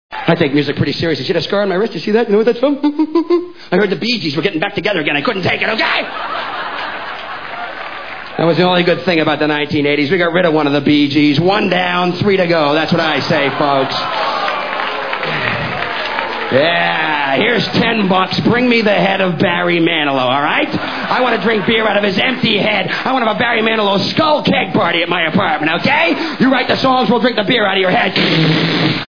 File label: music